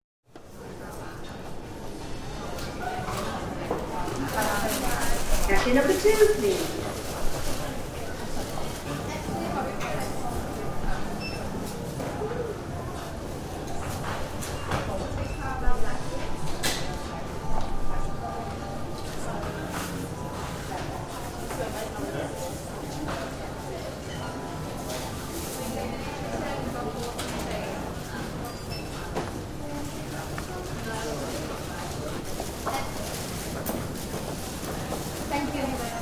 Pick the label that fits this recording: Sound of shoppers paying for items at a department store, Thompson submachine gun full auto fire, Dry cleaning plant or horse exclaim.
Sound of shoppers paying for items at a department store